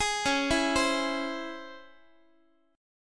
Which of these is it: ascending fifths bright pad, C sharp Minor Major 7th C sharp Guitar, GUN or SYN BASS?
C sharp Minor Major 7th C sharp Guitar